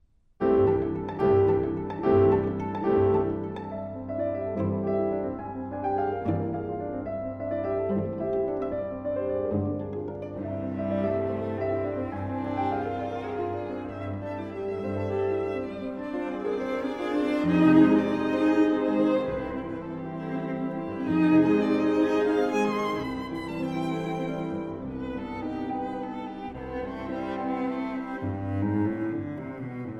Piano Quartet in A Minor, Vivo

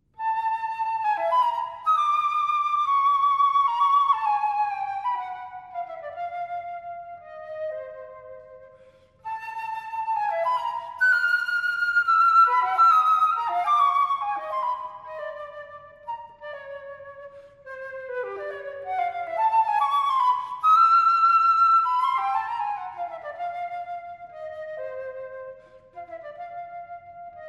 Pieces For Flute Alone, Gemachlich, leight bewegt

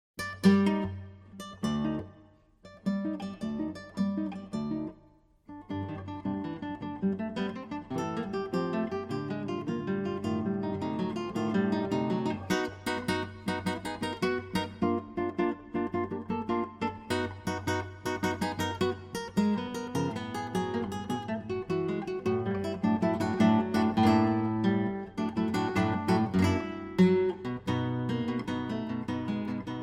Guitar; Castelnuovo-Tedesco; 24 Caprichos De Goya

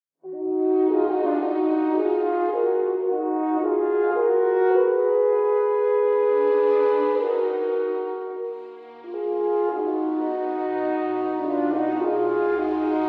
Concerto for 2 Horns in E flat Major, Romanza

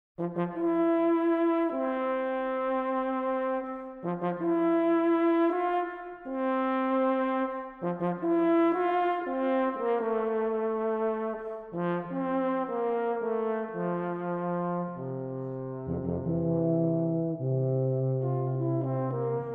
Profiles for Tuba Quartet, Heroic

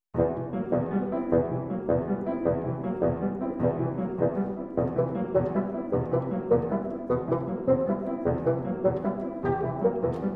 Suite pour Basson, Scherzo

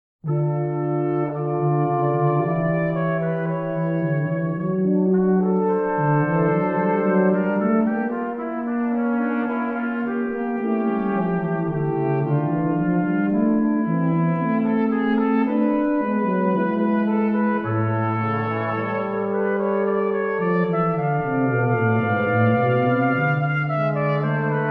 Prelude, Brass Quintet; Atlantic Brass Quintet

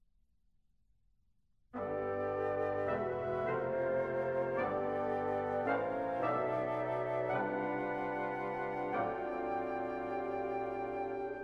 Concerto For 23 Winds, Andante, Allegro

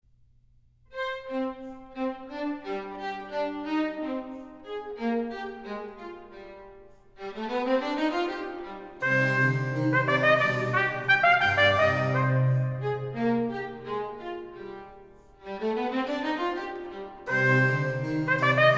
Concerto In C Minor Andantee E Spiccato

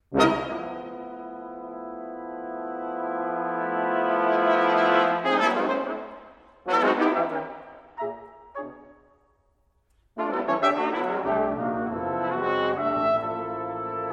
Games for Brass, Brass Quintet; Western Brass Quintet; Mvt. 1. Fast Moves

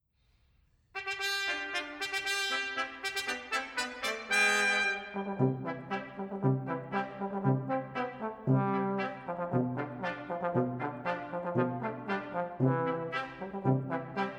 Polka; Jazz Suite No. 1

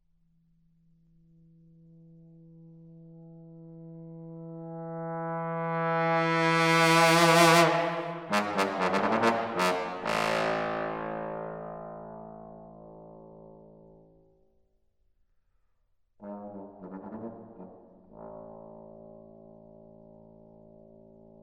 Improvisation for Trombone Solo

